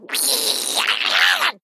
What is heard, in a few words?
Male Critter Attack